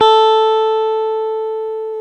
GTR, Dual Guitar; Club-50 - Foundations; Paul

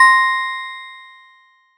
SY LUSHLY; ModelCycles

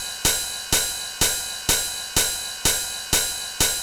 drum loop fixed hat 125, Classic Chicago House; Sample Magic; Drum Loops

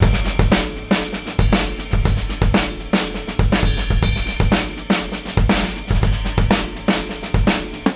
RIDE 120, OFFMIKE DRUMS; Dance

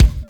KIT HOU; Best Service

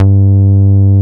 HOUS G3, HOUSE BS; Drums In Your FACE!

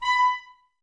Clarinets, Wood Winds

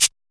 LINN SHAKER

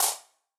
Wider Clap

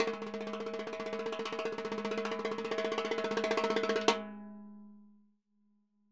Roland MV8800 percussion

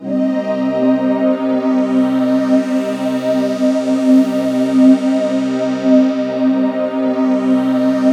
composite, ethereal atmosphere; USB Soundscan vol.13; AKAI

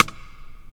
Rim

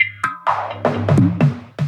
Fills 128BPM, Clubsounds

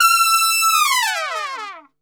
E 4, Brass Super Section, TRP; Best Service; TRP LNGFLL

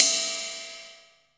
RIDE, CYMBALS

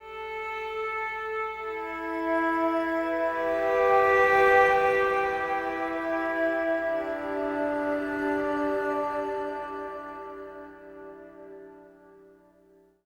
Acoustic Drone; Sonic Foundry (Sony Creative Software) - Friendly Fire; DroneLoop

